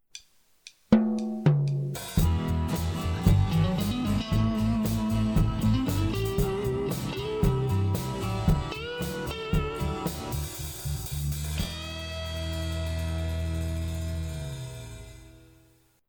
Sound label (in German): Song Mono Mixdown starker Limiter